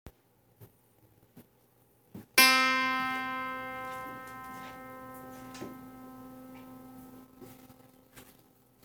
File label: auto pluck, zither